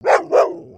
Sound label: doberman bark